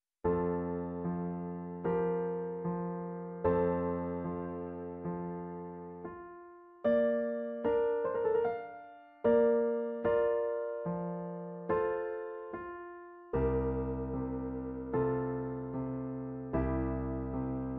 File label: thirds and sixths